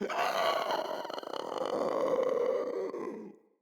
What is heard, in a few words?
Infested Runner Death